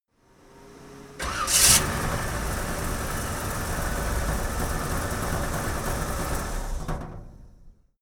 vintage car chevrolet 57 start engine; autos; verkehr transport

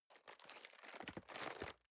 cardboard box medium turn soft; objekte

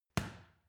boxing paw hit soft

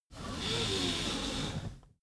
car seatbelt release slow

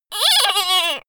little girl cry squeak; soundpool

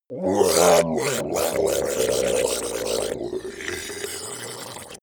ice golem melt groan; koerpergeraeusche; comedy-cartoon; soundpool

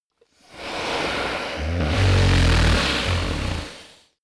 monster snore breath low; koerpergeraeusche; comedy-cartoon; soundpool

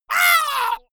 monster hit high; schreie

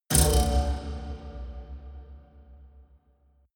haunted strings buzz low; hits explosionen

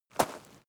step gravel single

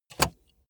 car bmw e46 interior safety belt insert

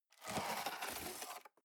cardboard box open scrape; objekte

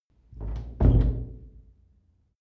old door close

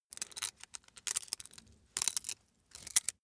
small metal handling short; industrie wirtschaft; metall

